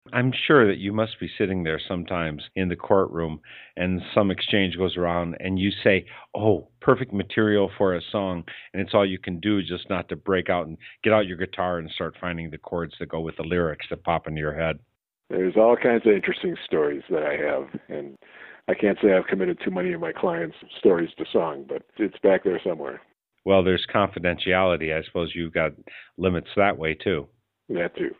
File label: singing